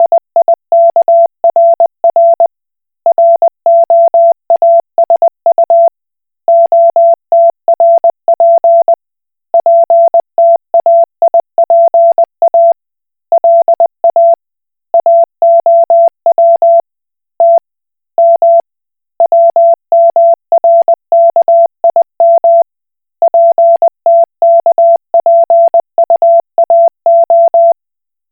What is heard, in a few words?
Koch Morse